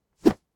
Melee Attack

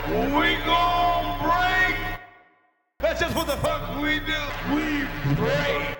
Break Vocal

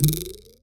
Modulated Ruler Spring Jump Cartoon Noise

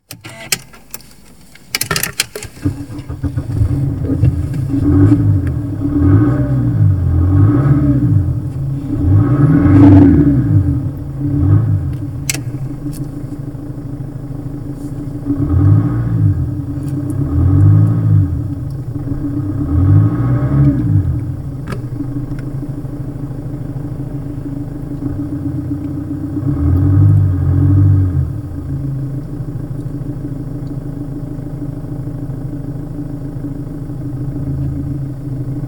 Car Motor

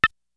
Toc

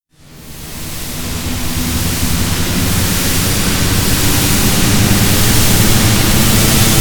Into Hyperdrive

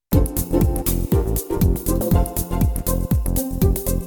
Music Bonus

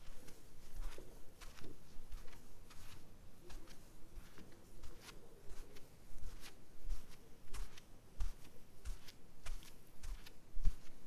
Walk On Socks Carpet Mono

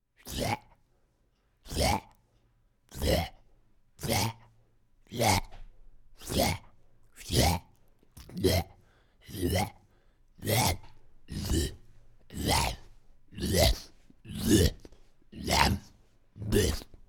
Zombie Vocals Grunts

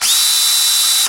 Drill

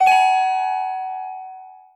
Item Pickup Chime

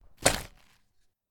Axe Impact

